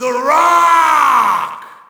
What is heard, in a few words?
Shout, audience; UFC Tapout 2